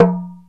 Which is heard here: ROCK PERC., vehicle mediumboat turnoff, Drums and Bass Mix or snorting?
ROCK PERC.